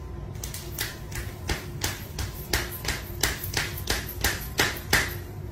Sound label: clapping